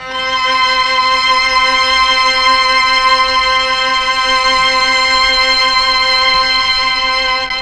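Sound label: MELLOTRON strings C4; Big Fish Audio - First Call Vintage Keyboards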